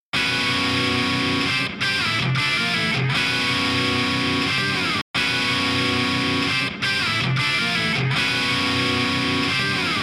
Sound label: Marshall stereo; Maybe a Marshall